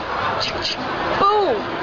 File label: chk chk boom